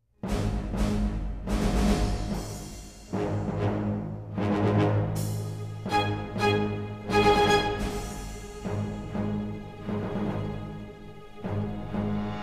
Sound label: movie trailer music